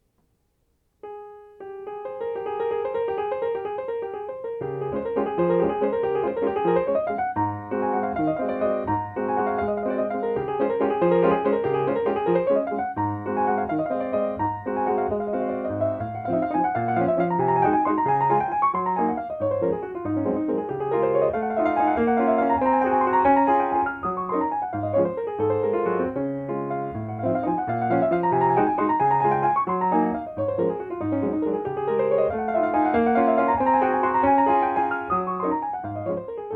Waltz in D flat Major; Minute Waltz; Op. 64 No. 1

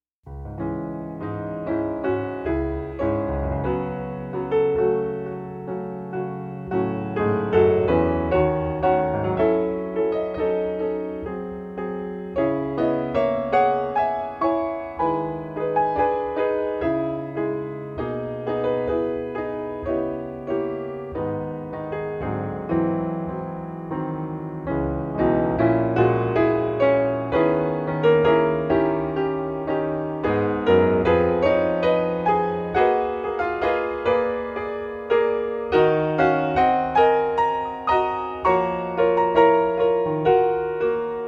Etude in C sharp minor

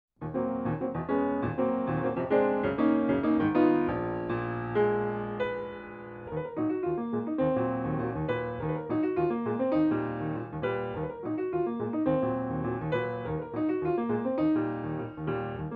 Preludes for Piano, Allegro ben ritmato e deciso; Three Preludes for Piano; Prelude No. 1

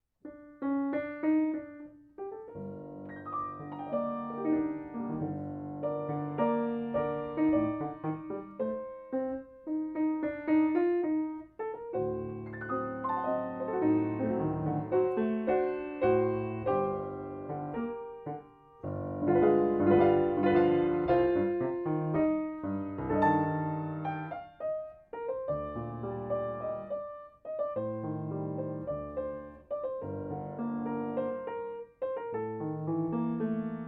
Tango

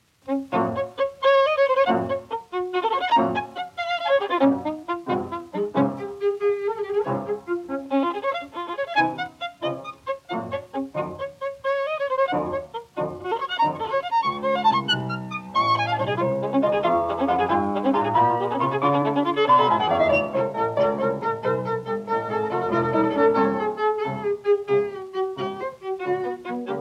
Piano Trio in E minor Allegro con brio

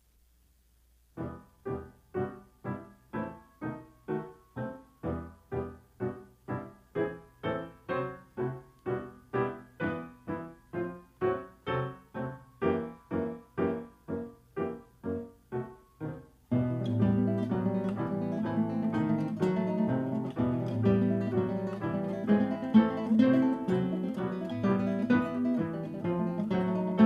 Concerto for Guitar Orchestra Allegro Capriccioso

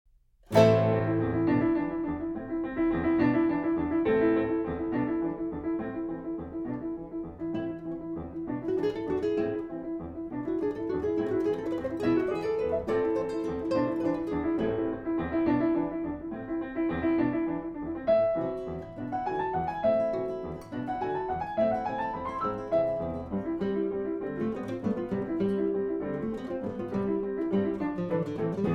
Spanish Dance

